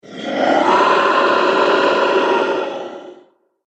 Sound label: Big Alien Bugger; Alien Sound Effects